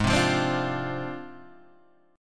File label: Gsharp 6th Flat 5th Gsharp Guitar Open G; down; chord shape